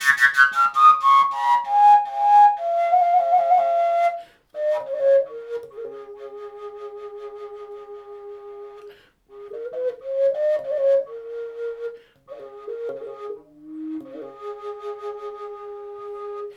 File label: Fujara Cis